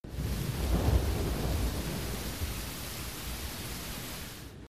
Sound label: rain